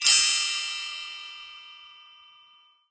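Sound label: matchmaking rank up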